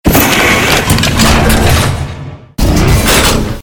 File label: reload flamethrower weapon